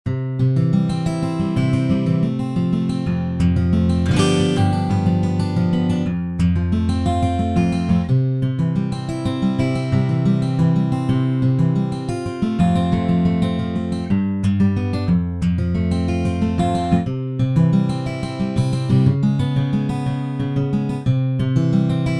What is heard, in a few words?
Bass Chord Steel Fng Pattern 6-8 Blues